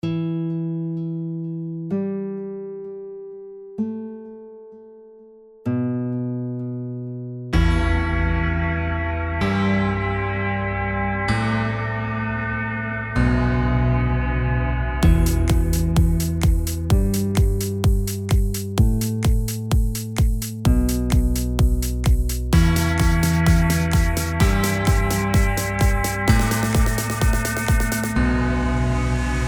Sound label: Huge Bells EDM Mix